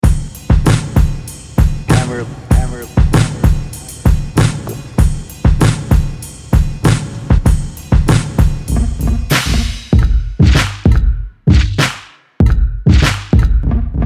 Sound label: Instrumental